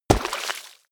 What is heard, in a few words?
horse step water